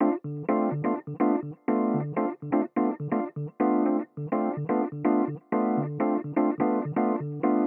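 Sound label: electric piano, 125bpm Dm, Nu Disco; Zero-G; Construction Kits; Speedy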